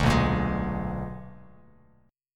B-Minor Major 13th, Bass Standard; BmM13; strummed; JGuitar

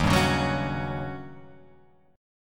D sharp 7th Sharp 9th Guitar Open F; strummed; JGuitar

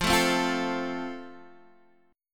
F Major, Guitar, Half Step Down; chord

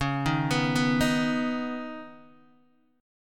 Db 7th Suspended 2nd, Guitar, DADGAD

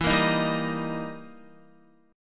E Minor Major 7th Flat 5th E down Guitar; EmM7b5; strummed

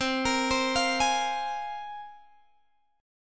C 7th Suspended 4th Sharp 5th, Guitar, Open G

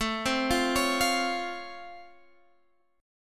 C sharp Augmented Major 7th A, chord

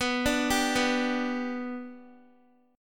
B-Minor Sharp 5th, Guitar; arpeggiated

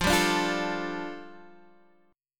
A 7th Suspended 4th Sharp 5th, F

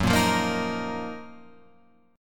Gb Major 13th Guitar Open F; GbM13 strummed; JGuitar

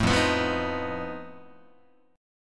F 7th Flat 5th F down Guitar; F7b5 strummed

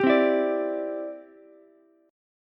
C Major Add 9th, Ukulele; strummed; JGuitar

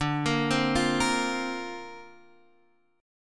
Bb Suspended 2nd D, chord; Bbsus2/D arpeggiated

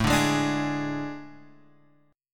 A Major 7th Suspended 4th A

